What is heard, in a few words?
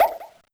transform Pauline; HeavenStudio; tram And Pauline